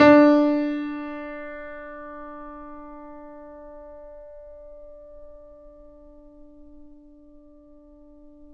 Mf D 3, Grand Piano off mic; AKAI S6000 CD-ROM - Volume 2

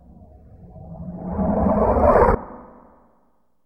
sweep; Sonic Foundry (Sony Creative Software); Ugly Remnants VOL-2

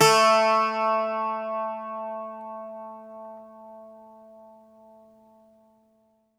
Greek Bazouki, Plucked Strings

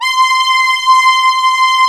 MOOG LEAD C4; USB Soundscan vol.49; Analog Fantasies; AKAI

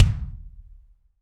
BD AMBIENT, Real Mega Drums; Best Service

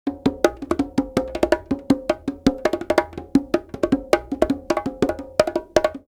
Live Perc Riffs; Jungle Frenzy 1

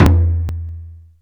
surdo O, Percussion; SN-R8-01 Contemporary

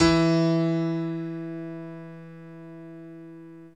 SY99 Piano E3; Drum & Bass; Total Drum&Bass

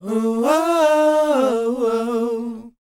WHOA, R&B & Dance vocal; ILIO; Vocal Planet

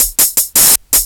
HH LOOP; USB Soundscan vol.27

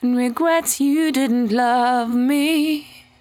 74-5bpm B, Velvet Vocal; While U Could; Zero-G

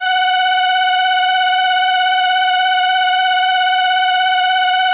SYN, ENSEMBLE

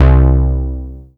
MICROSEQ C2; Best Service Dream Experience

